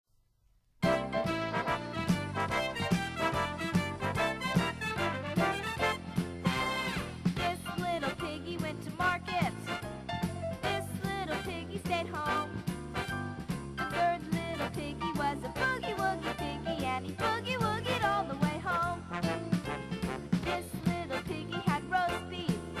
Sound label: boogie woogie